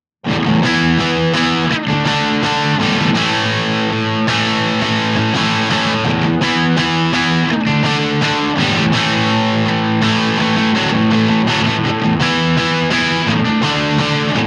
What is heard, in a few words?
crunch; jensentone